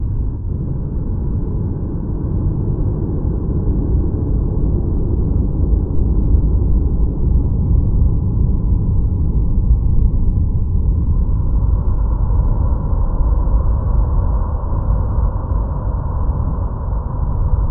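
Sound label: Ambient Ominous Loop; silent hill homecoming 2008 gamerip pc ps3 x360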